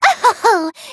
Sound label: ohoho; kotoko